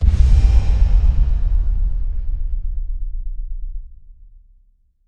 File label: warrior light beacon explode; Aragami